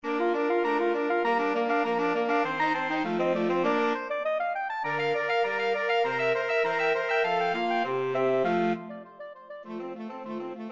saxophone quartet

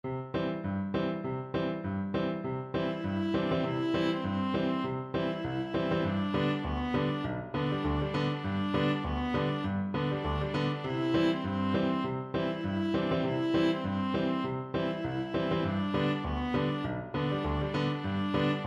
galop, viola

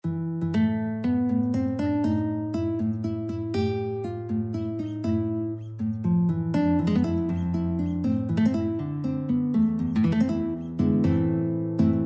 terciopelo violin guitar

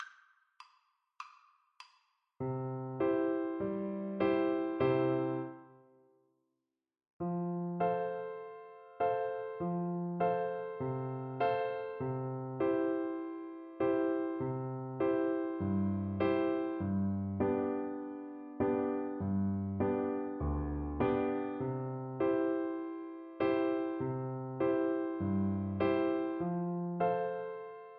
loving brother, oboe

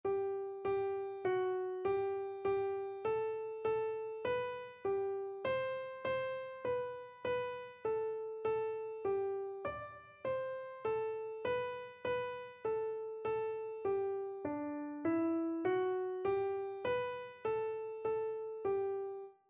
tallis canon, keyboard

